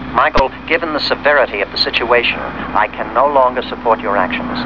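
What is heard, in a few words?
knight rider kitt stop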